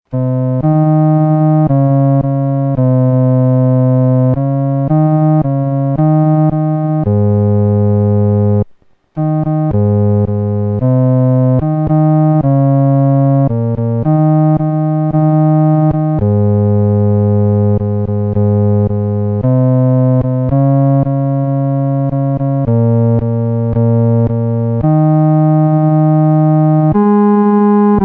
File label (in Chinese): 独奏 第四声